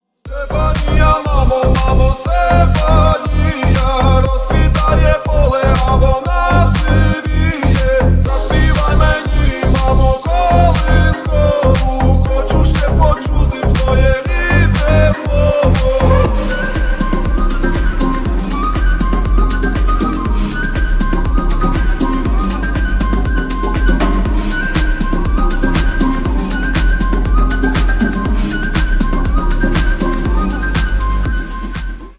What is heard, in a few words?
slap house remix